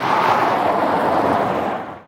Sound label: skid dirt long; Blind Drive